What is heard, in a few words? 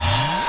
Sweep; World Masters Pro